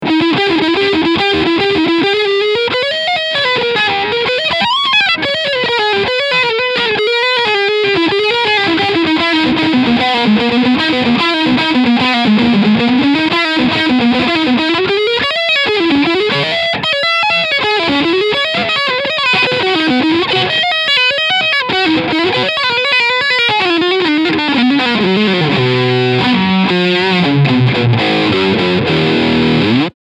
Kramer Guitars Jersey Star E Gitarre Overdrive Leadsound Steg Pickup

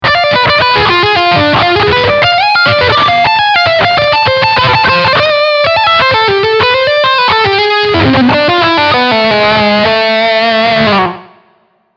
Lick Distortion Steg Humbuc

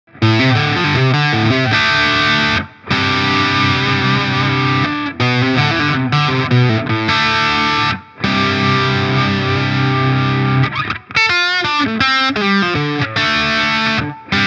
Harley Benton Guitar Kit Steg verzerrt

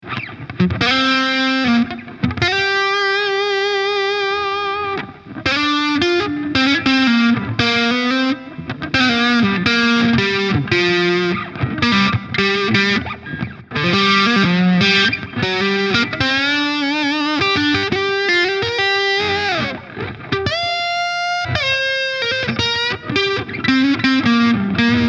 Framus Idolmaker Hals verzerrt

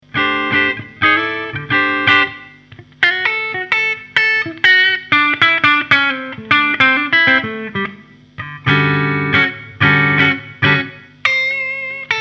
clean Hals Mitte Steg; Ibanez RG5320

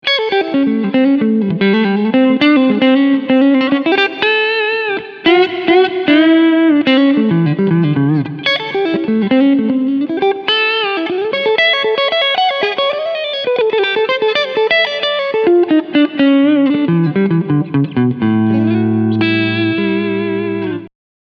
Hals PU Clean Picking

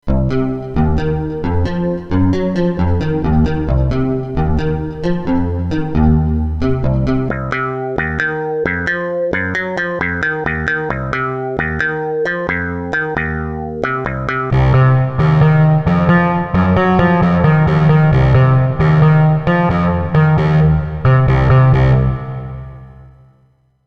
360 Systems Midi Bass Pro externe FX

